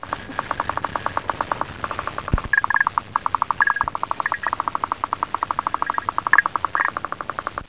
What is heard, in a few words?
female interested frog, clawed frogs